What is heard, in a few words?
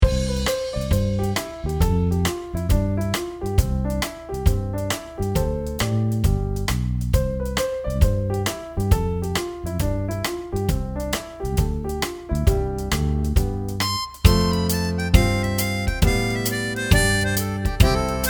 piano fun